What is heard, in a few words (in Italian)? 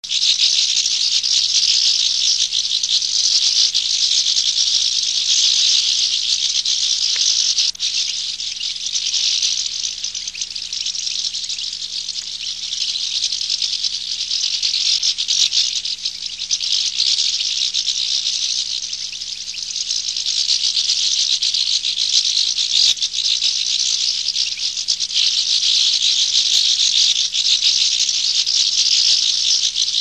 cardellino